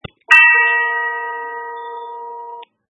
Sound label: chime